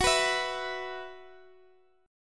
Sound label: Gb 7th Suspended 4th, Guitar, DADGAD; chordshape; down